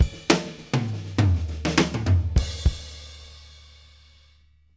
Fills 102; Zero-G; Monster Beats

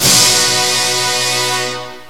vintage hit; Rubber Chicken Software Sample Bank

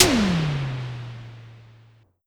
Drum X Tom; Pearl Drum-X

